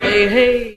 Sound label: hey hey male SUNG